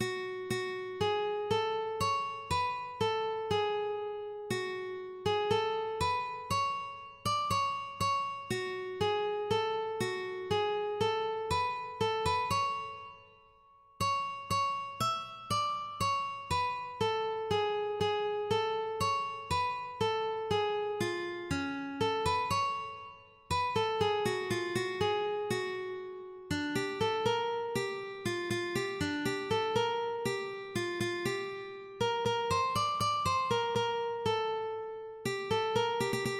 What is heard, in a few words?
aves, ukulele